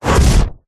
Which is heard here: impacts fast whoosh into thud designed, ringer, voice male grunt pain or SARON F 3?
impacts fast whoosh into thud designed